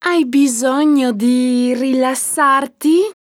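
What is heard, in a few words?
walk, Italian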